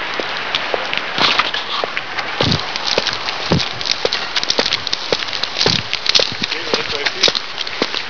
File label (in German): Schritt